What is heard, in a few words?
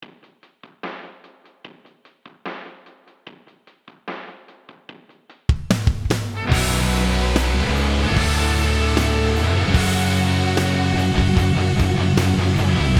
Bm 74bpm